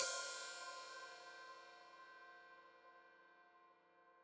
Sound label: Hat Open, Hi-Hat; Deeply Sampled Drums